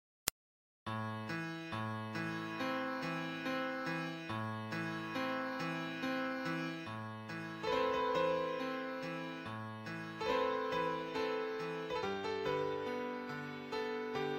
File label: piano